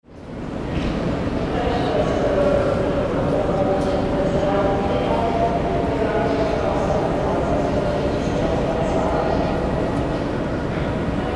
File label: railway station hamburg